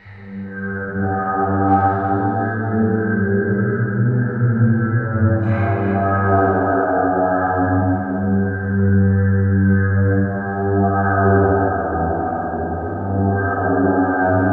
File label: Death Horn G2; Zero-G - Ghost In The Machine